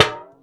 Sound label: Foley Bucket Perc; essential drumkit